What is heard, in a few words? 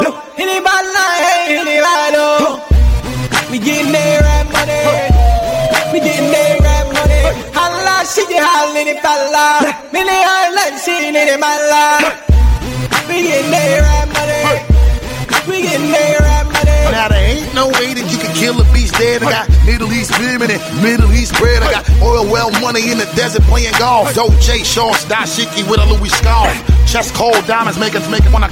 Arab; Dubai